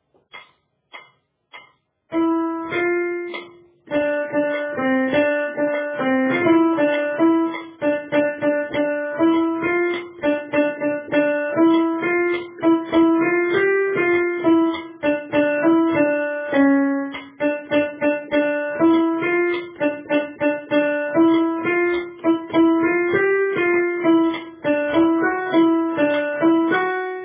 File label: Alto